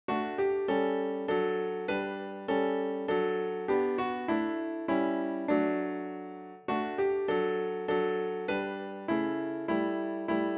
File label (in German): Schneeflöckchen Weißröckchen, für Klavier; deutsches Weihnachtslied für Klavier solo, mit Vorspiel; Schneeflöckchen, Weißröckchen - für Klavier mit Aufnahme